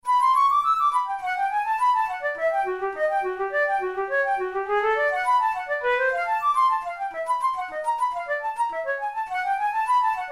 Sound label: studies for flute solo; karg-elert